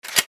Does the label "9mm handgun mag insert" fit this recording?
Yes